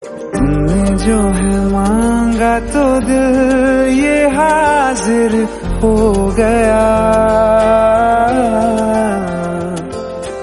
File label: ringtone